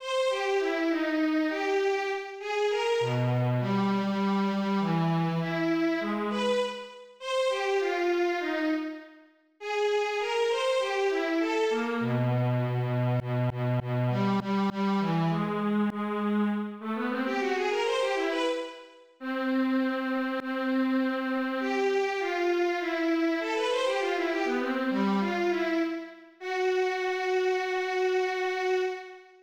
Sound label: tone